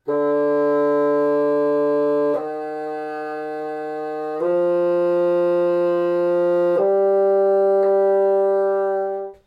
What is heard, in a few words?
bassoon